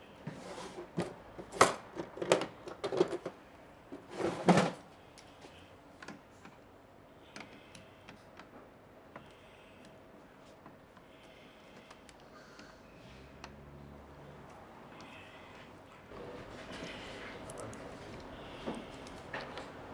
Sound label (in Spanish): AMB INT BAR UY Maquina Registradora manual; Arocena